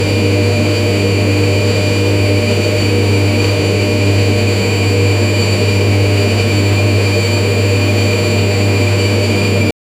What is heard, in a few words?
loud and unpleasant spinn, household